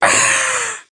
scream, creature

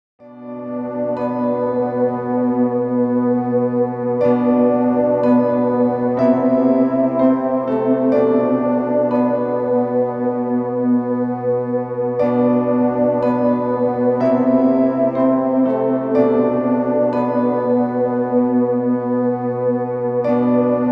Piano; Piano Pack

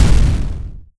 explosion; NIIIEMAND's explosion sfx

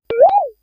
pep Sound, digital audio; kenney digitalaudio

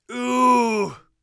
poisoned, warrior voice; Warrior Voice Pack

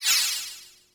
spell, magic

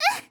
damaged, RPG Voice; RPG Voice Starter Pack

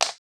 item gem; RPG

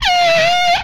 Skid, retro arcade; MMRetroArcadeSoundsPack; Vehicles

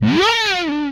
Death, Vocal; MMRetroArcadeSoundsPack

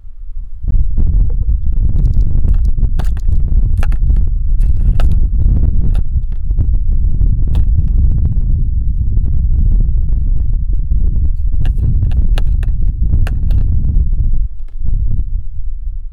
Standing Under An Air Vent; I Was Standing Under An Air Vent Like A Dumbass; BB Retail Therapy Sample Pack

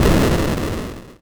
explosion wide

